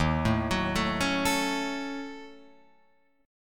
D sharp Minor 7th Flat 5th down Guitar Open F; arpeggiated

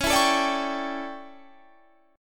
A 11th Db, guitar chord

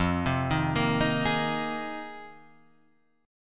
F Minor 11th F down, chord; Fm11; arpeggiated; JGuitar

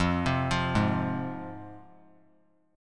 F Minor F down; Fm arpeggiated; JGuitar

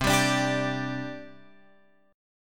C Suspended 2nd, C, down, Guitar, Open F; Csus2 strummed

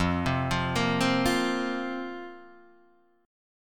A sharp Suspended 2nd F

